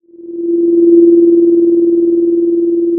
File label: pop, Sound of a phone ringing